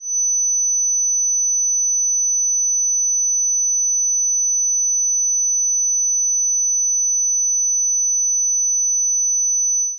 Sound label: 6khz